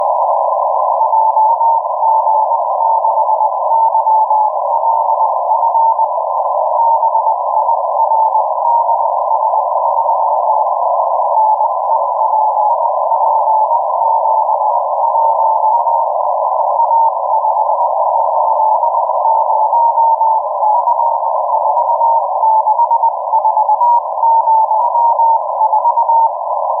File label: NEPALISAT-1